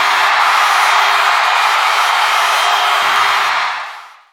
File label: CROWD